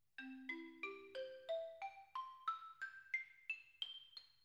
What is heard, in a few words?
Xylo